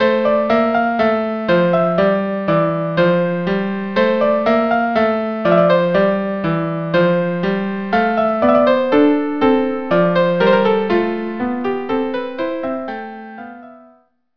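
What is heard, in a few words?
lullaby violin cello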